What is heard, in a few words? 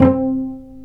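strings cello pizzicato, dry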